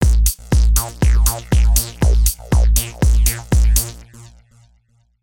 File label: Acid house 120